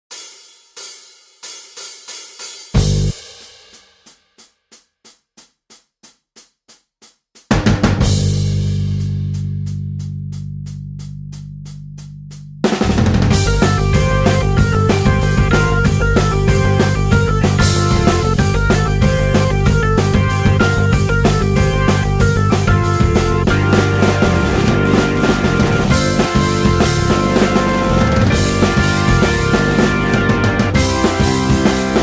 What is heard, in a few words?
(Gm) No Guitars